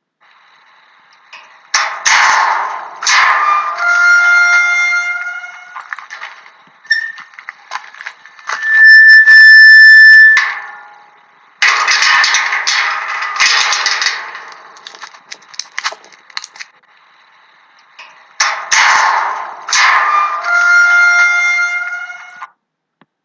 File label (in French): PORTAIL; ENSIGNE